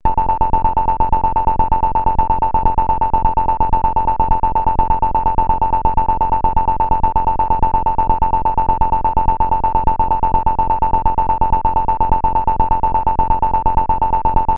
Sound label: Bonus Stage; Mario Bros.; arcade